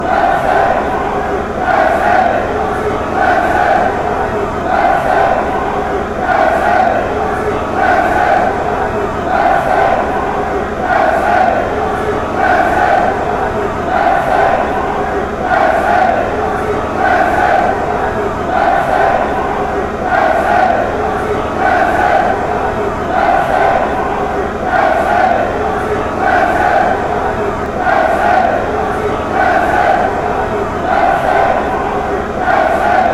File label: chant, fanchants; genk